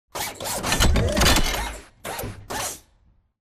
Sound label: combat run turn; Titanfall 2